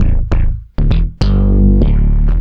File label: Philter (phuz bass); Sonic Foundry (Sony Creative Software); Ugly Remnants VOL-2